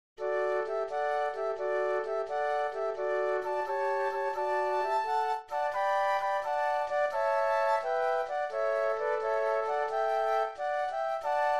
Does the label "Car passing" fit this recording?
No